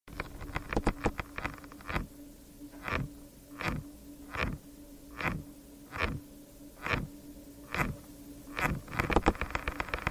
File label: STRIDULATION Schizocosa stridulans stridulation